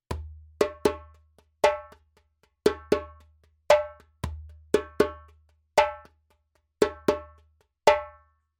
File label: djembe; djembebog oevelse